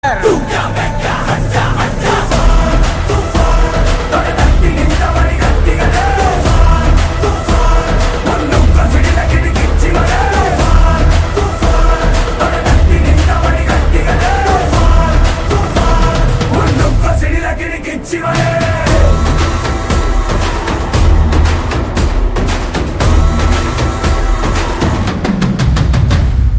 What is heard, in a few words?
Kannada Ringtone; KGF 2